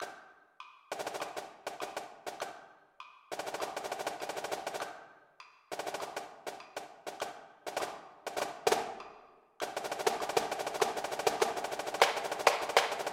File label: drumline audition sd